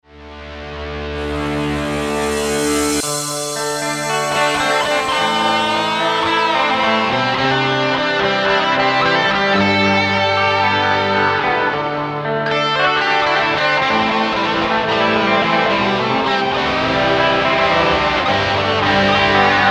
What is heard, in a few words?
guitar solo